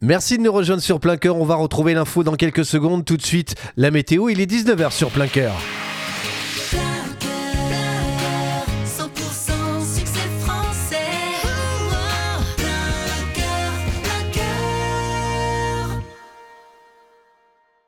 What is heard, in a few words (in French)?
TOP HORAIRE NEUTRE; PLEIN COEUR; MOULINS